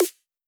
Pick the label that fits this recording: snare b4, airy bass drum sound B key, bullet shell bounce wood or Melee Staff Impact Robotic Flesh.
snare b4